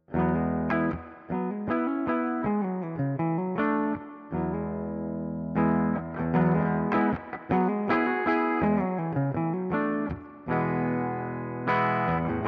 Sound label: Bridge Clean, electric guitars; Gusta GST 05 HSS; gst models